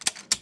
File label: reload; zombie shooter